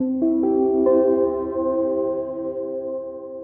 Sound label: save; 16bit